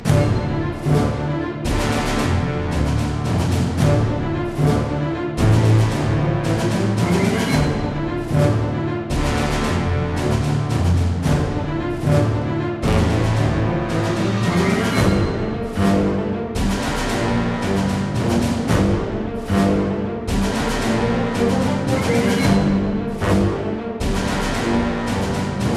Intense Action Loop

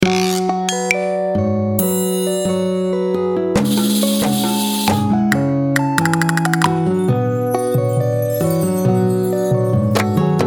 desolate mountain solo trails

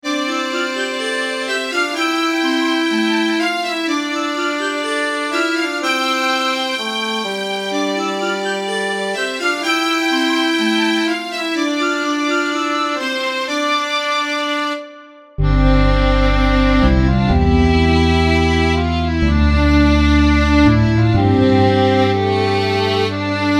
Short repeating song fit for a peaceful town.

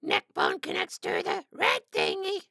Minion Medkit

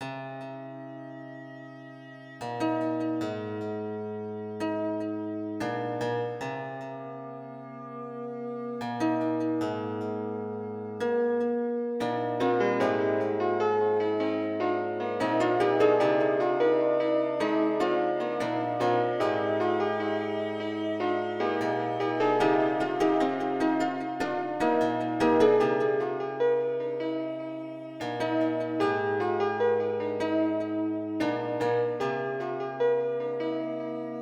piano

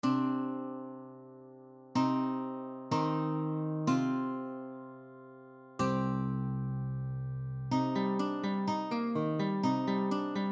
steel

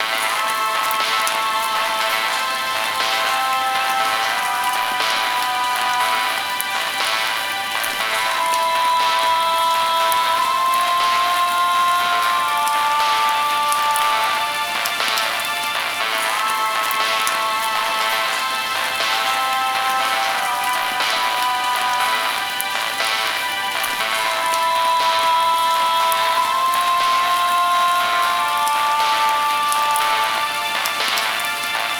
death loop, zombie soundtrack; unreleased

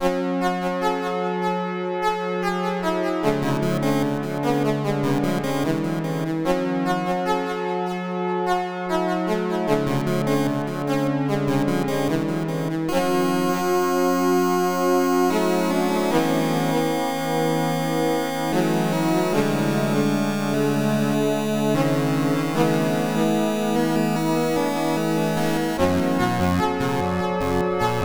night tune

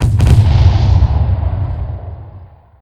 Big Explosion

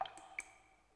water drip

